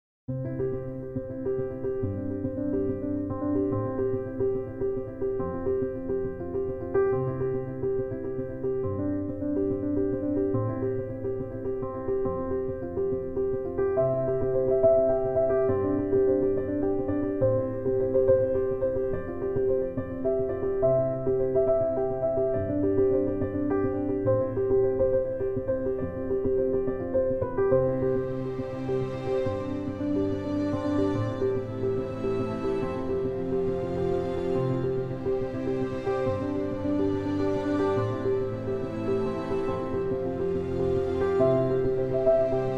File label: soft classical piano; virtualmax